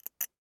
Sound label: Dentist Plaque, Medical; Blastwave